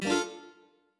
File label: Instr Guitar; backpack hero gamerip 2022